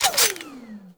Emplacement Mech End; Grineer; Weapons

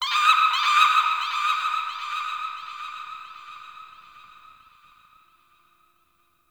Forest Distant Misc Animal, Ambience; Warframe; Grineer

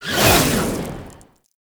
Power Slide Start, Motorcycle; Warframe; Motorcycle Ninety Nine

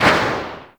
SMG Tail A; Tenno; Sundial

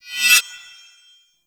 Bayonet Melee Dash Accent; Warframe; Tenno

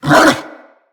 Tame Bark; Kubrow

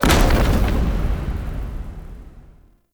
Drawbridge Stop; UnderCity

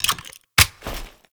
Quad Sniper Reload Clip In; Warframe; Weapons; Tenno

